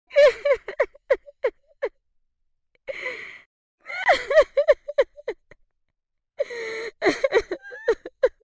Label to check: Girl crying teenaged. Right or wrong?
Right